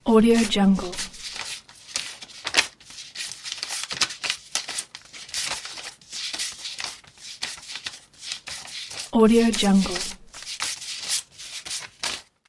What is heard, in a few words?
counting bank notes